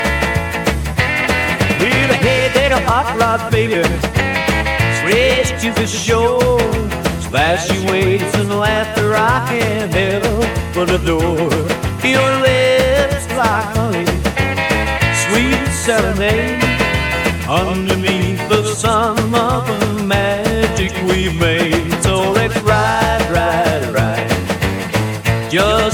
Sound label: Rockabilly